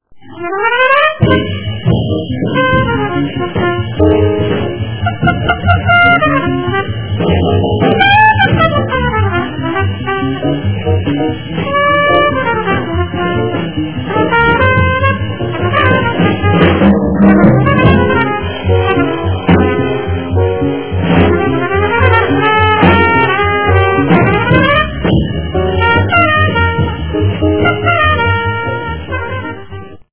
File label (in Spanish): trompeta